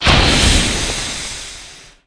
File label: Firework